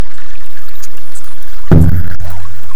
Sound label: Inia whistle